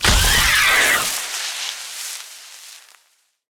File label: death, phantom; mob; Minecraft; Conquest Default